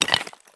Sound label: jump tile; Escape from Tarkov